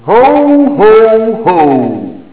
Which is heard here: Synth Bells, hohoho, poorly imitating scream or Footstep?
hohoho